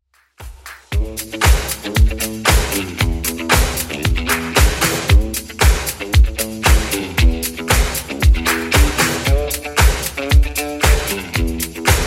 80s Redrum 115bpm

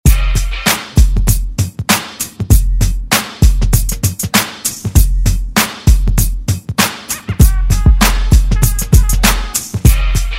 Oldschool Redrum

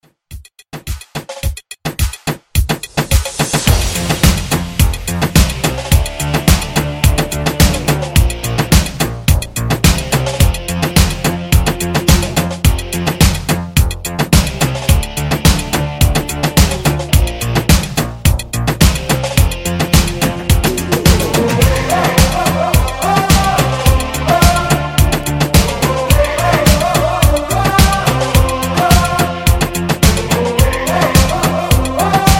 80s Moombah Redrum; Remixfreakz

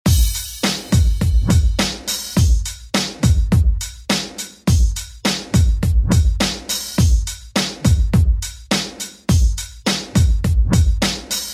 Re Drum; DJ Mhark

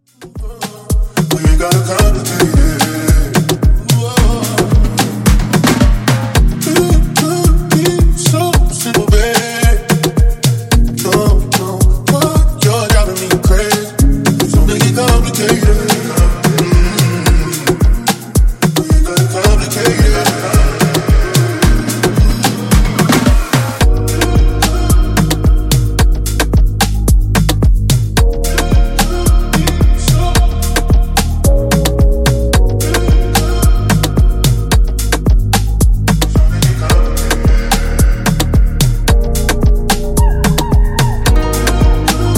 110 Bpm

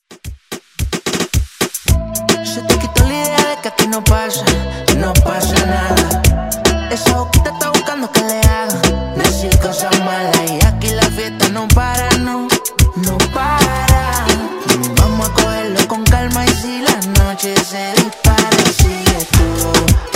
Reggaeton Redrum

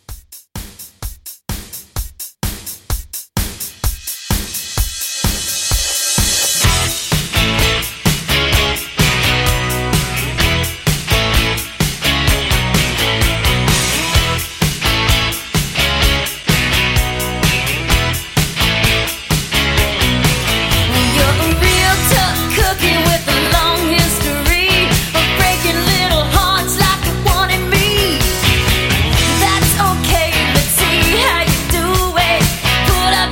70s Rock Re Drum

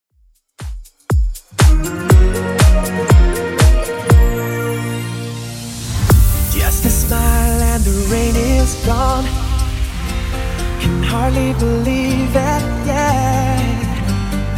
90s Uptempo Redrum 120bpm CLEAN; remixplanet

